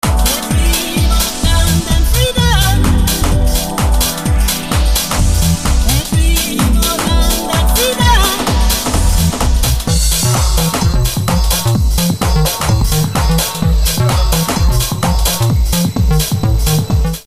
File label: garage